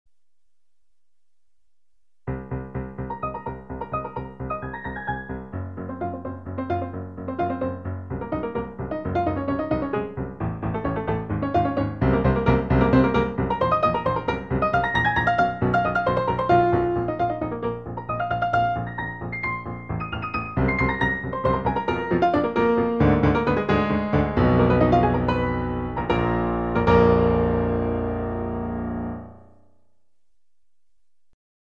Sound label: piano solo music, modern